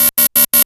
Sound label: ammo low; cdogs